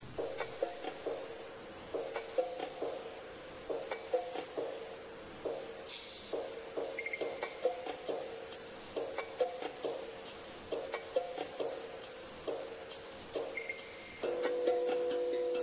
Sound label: taiko